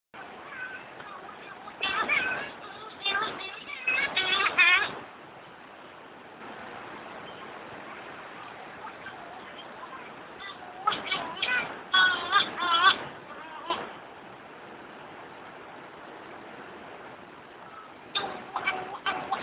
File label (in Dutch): vogels; Azoren